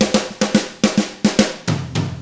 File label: POP ROCK, Double Platinum Drums; ILIO; Double Platinum Drums 1